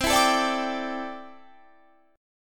A Minor 11th C, Chord

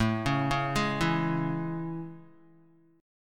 A Major 7th, Guitar; arpeggiated

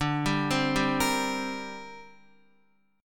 D 7th Suspended 4th Sharp 5th, down, Guitar; D7sus4#5; arpeggiated; JGuitar

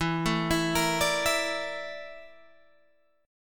A sharp Major 7th Flat 5th E

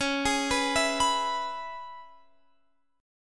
E-Major C sharp; E/C#; arpeggiated

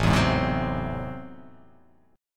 A Minor Major 13th, down

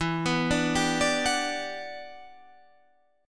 E Minor 9th, down

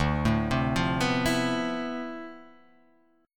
D Minor 13th, chord; Dm13 arpeggiated; JGuitar

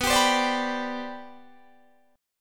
G Major Add 11th, B, Gadd11/B chord; strummed

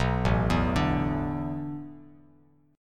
A Suspended 2nd Suspended 4th, Bass Standard; Asus2sus4 arpeggiated

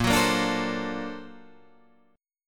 A sharp Augmented Major 9th Guitar Open D

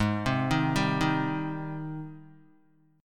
Ab Major 7th Ab down Guitar 4F 4A 5C 5G 5C 6E; AbM7 arpeggiated; JGuitar

